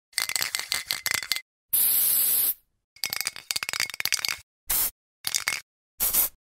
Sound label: Shake spray